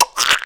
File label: P SHCLACK, VOICE; Rarefaction - Poke in The Ear With A Sharp Stick